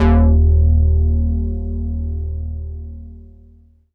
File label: Chorusio d#2, Korg Poly-800